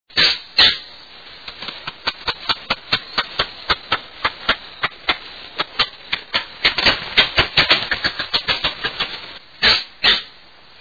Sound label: Green Heron